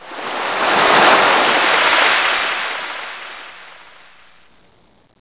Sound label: wave